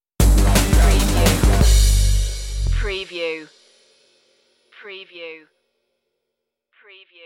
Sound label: deep pulse stinger